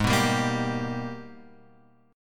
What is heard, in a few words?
Gsharp Minor Major 9th; G#mM9; {4 2 5 3 X 3} strummed